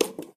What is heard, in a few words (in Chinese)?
洗手液